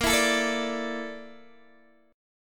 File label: Bb Major 7th Flat 5th Bb Guitar DADGAD